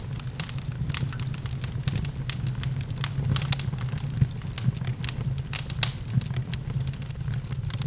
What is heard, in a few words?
fire; Mafie 1